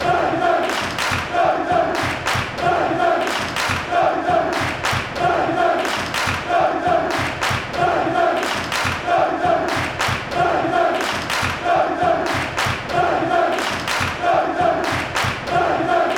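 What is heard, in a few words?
football chants; chory castro; Real Sociedad de Fútbol is a Basque Country based team from the city of San Sebastián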